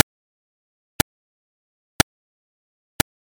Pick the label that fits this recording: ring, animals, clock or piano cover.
clock